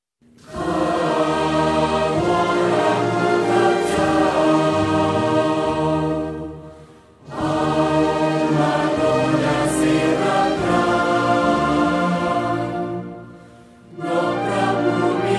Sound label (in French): hymne royal thai